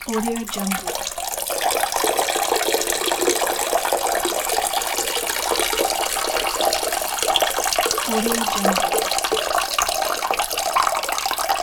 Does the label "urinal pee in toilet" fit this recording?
Yes